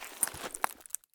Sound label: prone glass; Escape from Tarkov